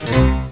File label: Error